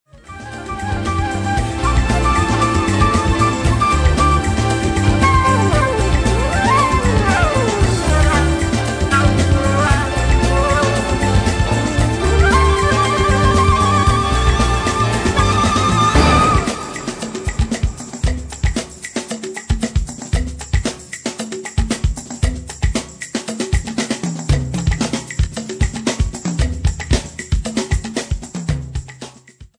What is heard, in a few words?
jazz